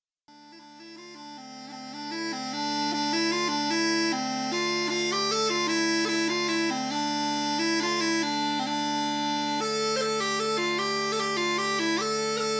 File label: Smallpipe